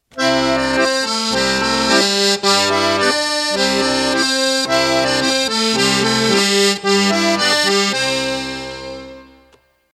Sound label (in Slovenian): HARMONIKA; RS AVIZZO